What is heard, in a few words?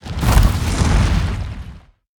skill sandstorm cast